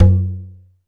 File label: ETHNIKA, PERCUSSION